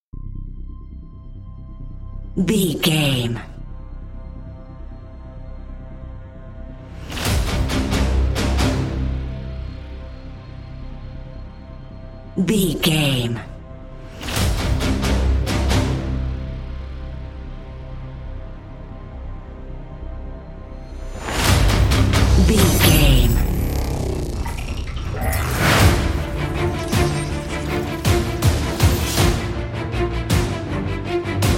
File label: epic hybrid trailer